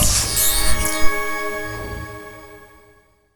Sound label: Pick Up Jingle